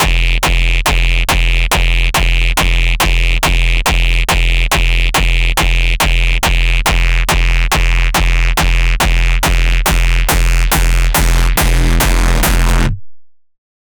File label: hardstyle overdrived piep, bass